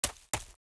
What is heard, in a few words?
mob run; Monster; Champion; 야수맹; 창비후